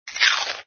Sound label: gulp